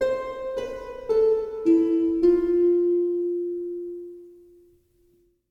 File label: Bellway Unlock Melody